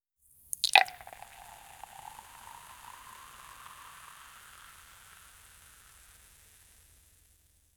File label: opening a beer bottle and, beverage